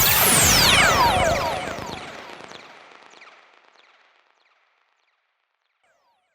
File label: Zap, PsyTrance